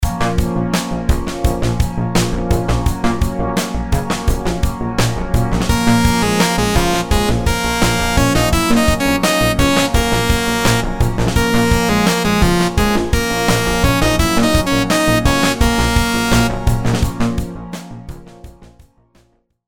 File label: rhythms simple dotted 8s 85